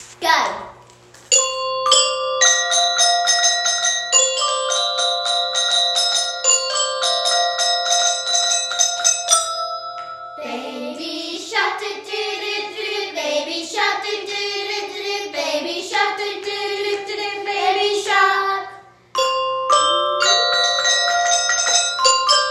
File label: quartet; Waterhouses quartet